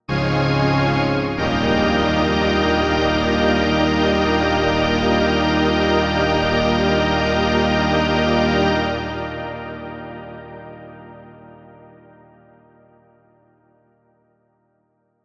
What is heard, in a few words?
strings halled; stringer